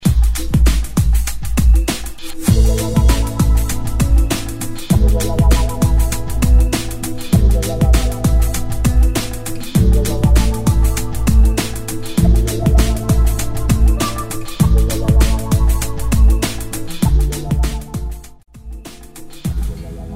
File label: 70s; redrum